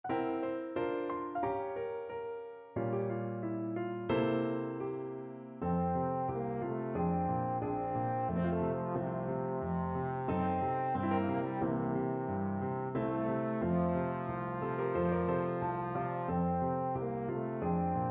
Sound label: trombone